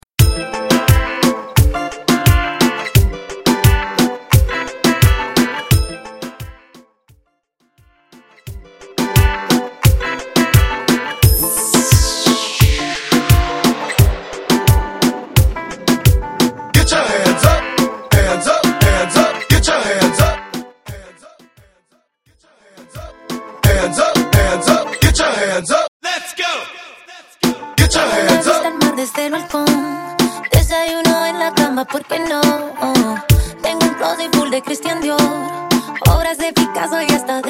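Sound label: Latin Hype Intro Extended Mix; Mainstream VIP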